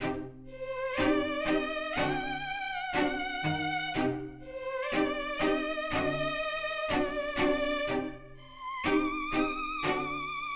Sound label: Menu Horror; Replay VHS is not dead